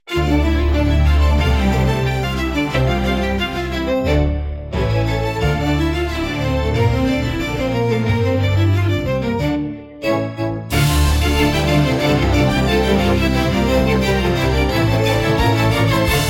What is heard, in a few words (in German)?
ohne percussion